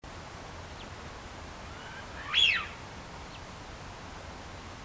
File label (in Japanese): hidorigamo